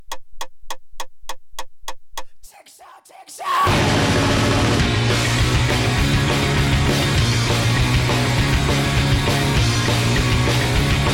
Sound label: karaoke